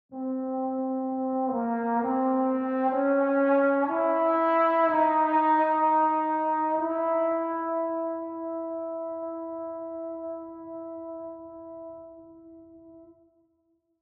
Reverb, trombone solo; Virtual Orchestration

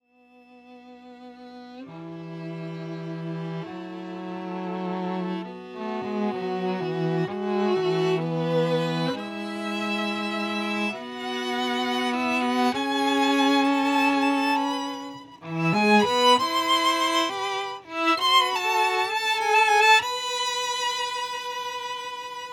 Overture, live strings DRY; Virtual Orchestration

